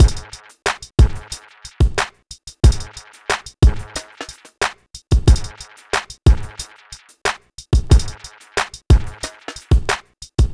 Drums, Hip Hop

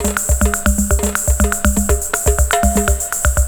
LOOP 122; Best Service; Hyper Dance

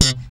POP C, Bass; Spectrasonics - Bass Legends CD 1; ALL THUMBS

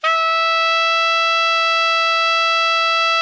ALTO MF E 4, Sax; Greytsounds; Philip Wolfe David Crozier's Saxophones

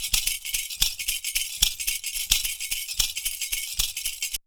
PERCU; Univers Sons; Raricussions

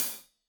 Hat Loose, Hi-Hat, Cymbals Stereo

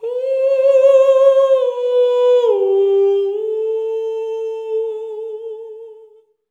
FALSETTO; Spectrasonics; Symphony of Voices

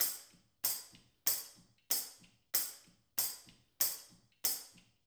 TAMBO, TAMB; Spectrasonics; Liquid Grooves 1 & 2; TAMB+TRIANGL

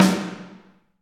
SD Real, Roland; Sound & Vision - Gigapack I

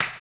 HAMBONE, Vocal Blues Soul; Spectrasonics; Planet

